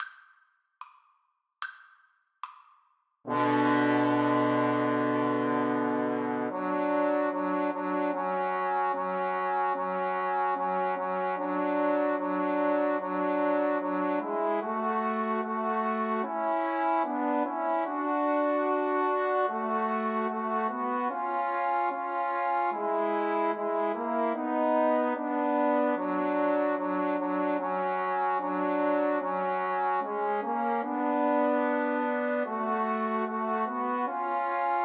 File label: Trombone Trio